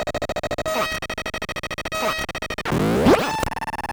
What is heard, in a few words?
Bent Talking Teacher, Circuit Bent